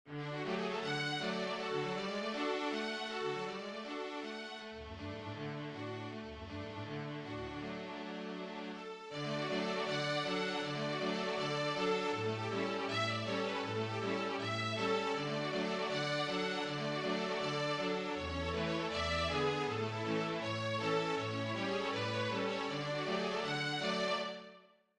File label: Schubert Trout Flute Trio; Trout (Die Forelle) Op.32 - Schubert